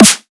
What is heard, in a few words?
normal hit clap; 『Chip 1.1』; Hitsounds; Neuro